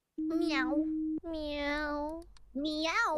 meow

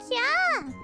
Kyaa

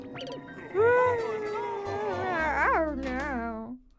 dying noise; Mario

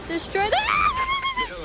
BAAAHBABABAB; IRyS; Destro

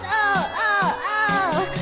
ow ow ow

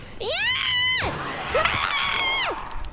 Scream YAAAAAAAAA; IRyScream

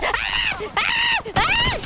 Scream; IRyScream

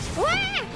Waah; IRyScream

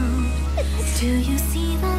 Sneeze

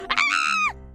aaarrrggh; IRyScream

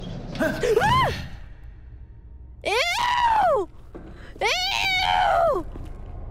Scream EEEEEWWW; IRyScream

